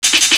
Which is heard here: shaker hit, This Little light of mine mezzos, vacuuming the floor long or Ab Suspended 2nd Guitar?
shaker hit